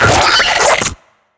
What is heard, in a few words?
pre explo; slender; attack of the radioactive thing; minion